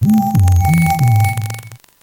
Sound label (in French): Cri